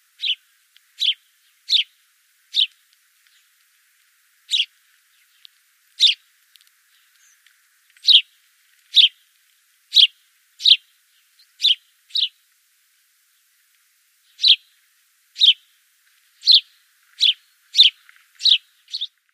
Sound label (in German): Haussperling Ruf